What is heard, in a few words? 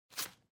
Bingo Card Noise